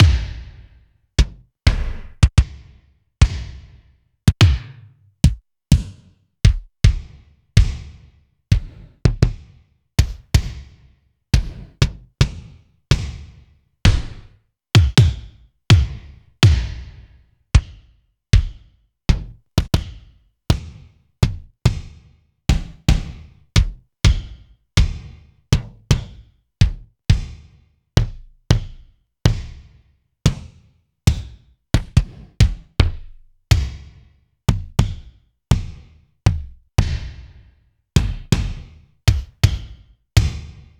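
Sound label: Alesis D4 Kick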